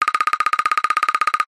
cartoon bird woodpecker

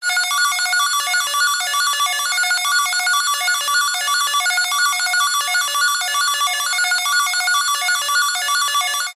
science fiction data stream computer

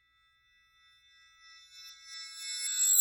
Swell Metallic Ring; bottlerocket

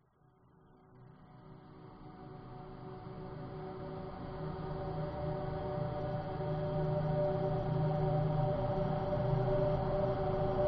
horror texture drone processed; scott lawlor

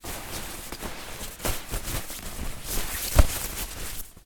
foley sleeping bag movements

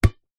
sport ball netball cheap catch hands

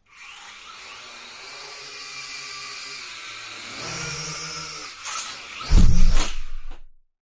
Drone Falcon; fox audio

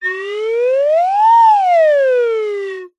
cartoon slide whistle up down med speed; zapsplat

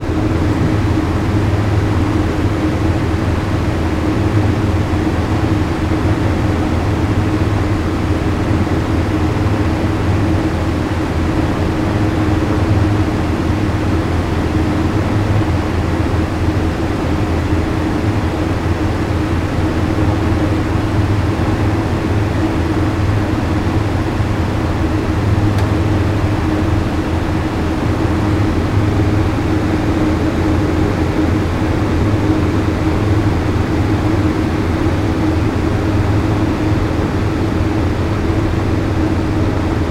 industrial freezer walk in factory

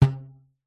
foley rubber band ping; zapsplat

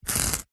office brick pen ball point score mark

